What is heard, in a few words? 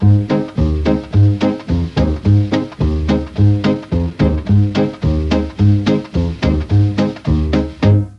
C3 SAX, mellotron; FOXTROT+SAX